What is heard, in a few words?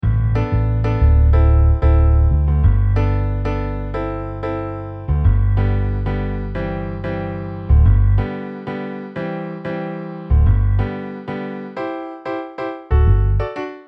Piano Bass MIDI